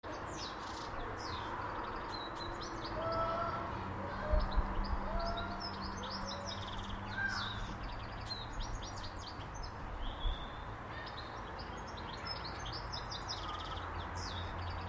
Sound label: birdsong and other spring garden sounds